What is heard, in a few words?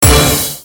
stop big; Flying Dutchman